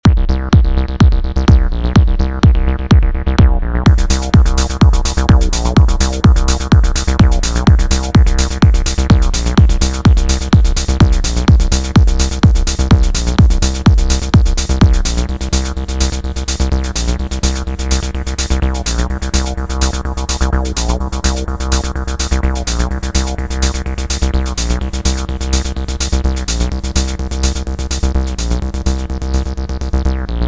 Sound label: techno 90, acid; 100 acid vol 2